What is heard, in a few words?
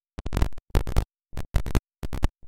Cable; Cherricar Cable